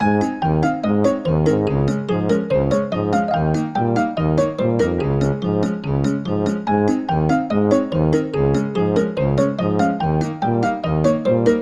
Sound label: Character Selection; peppa pig world adventures